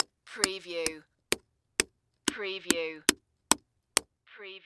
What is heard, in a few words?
Croquet Wicket Hammer